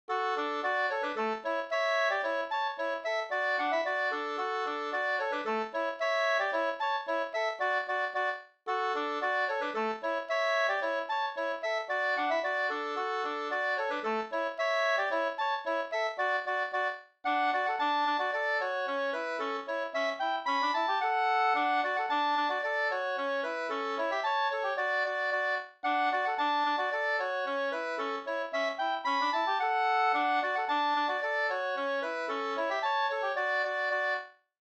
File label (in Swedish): polka, D dur